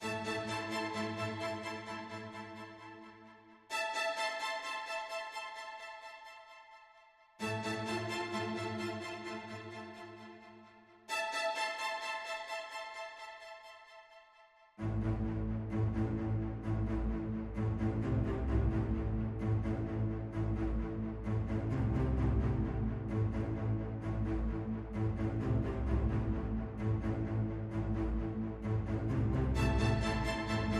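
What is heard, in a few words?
Staccato Strings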